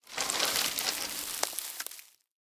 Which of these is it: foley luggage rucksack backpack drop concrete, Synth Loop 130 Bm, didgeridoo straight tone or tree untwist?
tree untwist